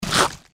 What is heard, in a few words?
gecko chomp; npc